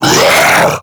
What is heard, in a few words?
Infested Diseased Ancient Pain